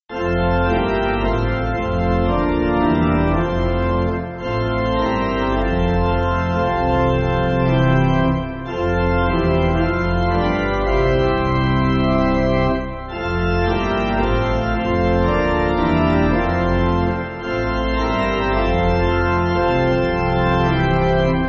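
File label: Pipe Organ, church backing; Gud Skal Alting Mage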